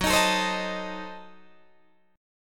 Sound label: F sharp Diminished 7th F sharp Guitar Open D